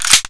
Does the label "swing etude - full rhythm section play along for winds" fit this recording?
No